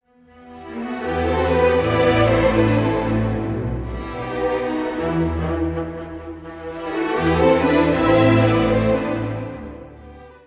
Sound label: Serenade for Strings